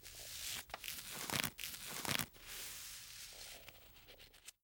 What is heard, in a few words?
Hair Brushing Hard; Fairlight Sound Lib